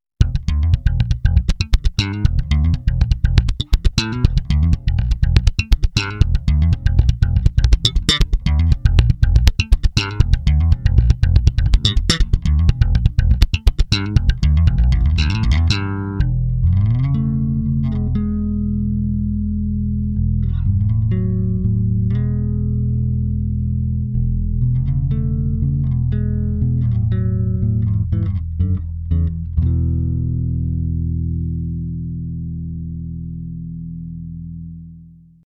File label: bypass; Maxon VJR9